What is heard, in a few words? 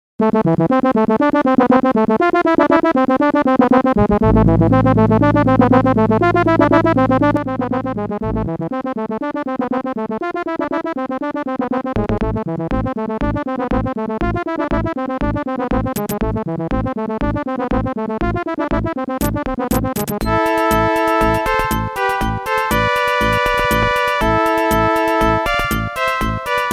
adlib; silpheed credits